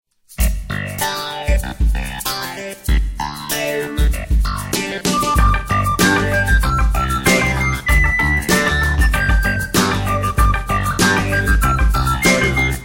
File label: Instrumental; Hallelujah! Praise Ye the Lord!